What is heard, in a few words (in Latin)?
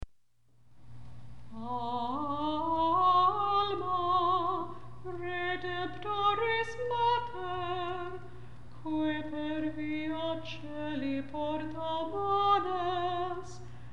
Alma redemptoris (chant)